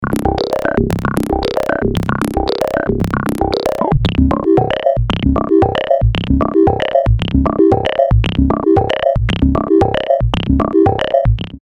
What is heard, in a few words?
pitched saw